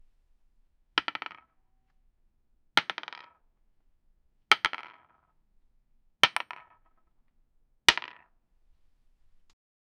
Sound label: sound of a heavy dice; objects